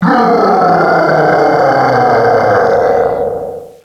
gwauuuuuugh, Echo (inside caves, underground ect.); DS; ML BOWSERS INSIDE